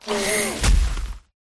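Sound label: pterodactyl buff; pterodaktilj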